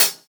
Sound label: Closed Hat, JUNGLE BREAKS